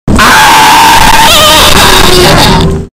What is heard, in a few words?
scary horror scream